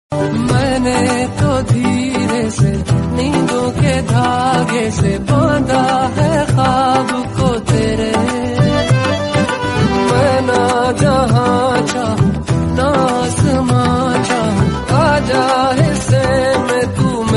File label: rington